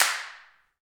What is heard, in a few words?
Handclaps; Roland LCDP14 Africa VOL-2; Afr. Hands